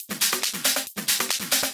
Nightfly Kit 138BPM Loop, Drums & Perc; Vengeance Samples; D minor

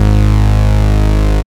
Bass Cuts; Vengeance Samples; VES1 Instruments

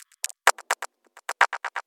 Electro Loop 128 BPM; Vengeance Samples

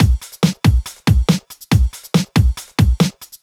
Loops BB 140, BreakBeats; Vengeance Samples

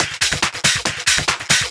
Loop 140 BPM; Vengeance Samples

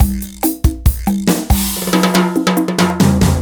Fill 140 BPM

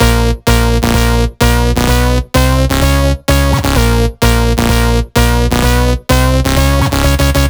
128BPM Melody; Vengeance Samples; VFH1 128BPM Contradiction Kit

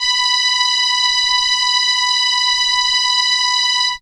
Trumpet B5, ARP Solina; musicradar-synth-samples

